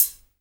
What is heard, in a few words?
HH, Dance Mega Drums; Best Service; HH HIP